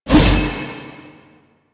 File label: Spear Gun Throw Fire; Weapons; Warframe; Tenno; Hoplite